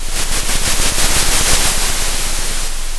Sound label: center, Sound of a train